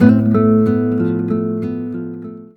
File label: A MINOR LI, Nylon Guitar